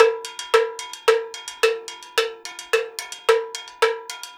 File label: 110 COWBELL, Percussion Loops; USB Soundscan vol.36; AKAI